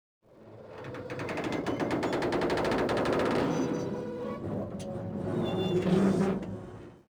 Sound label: metal creaks, cargoliner; Soldier Of Fortune II Double Helix; XBOX; ambience